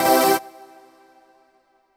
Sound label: HOUSE, House Dance